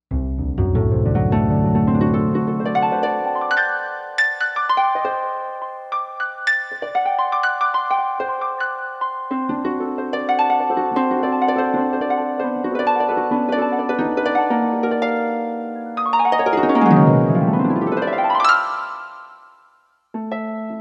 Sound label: Harp, Korg M1; Factory Patches